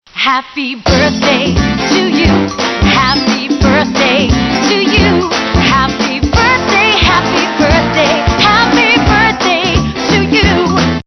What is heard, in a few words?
SATB; faces